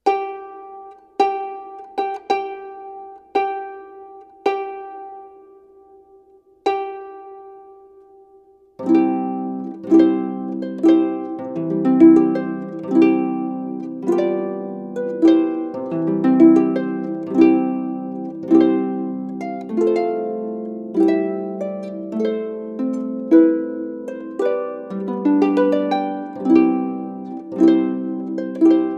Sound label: Bridal March